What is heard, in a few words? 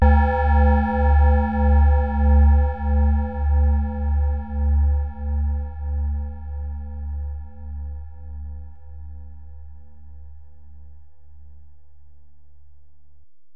Bell, Alesis DM-PRO